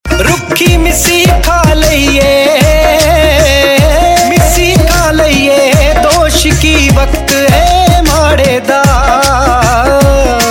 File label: Ringtone, Punjabi